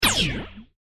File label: Heavy Duty Laser Gun; star wars / trek style games; Andrew Fleming